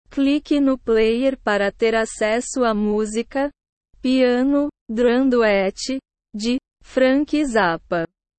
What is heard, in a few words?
piano drum duet